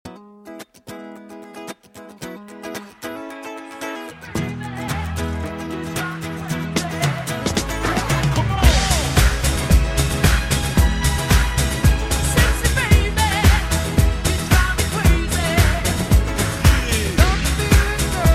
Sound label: Trance Remix